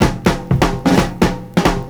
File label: FILLS, 70_s Breakbeats; USB Soundscan vol.46; AKAI